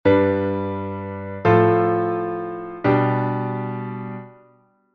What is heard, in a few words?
dominant prolongation